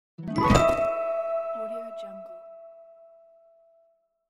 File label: open magic chest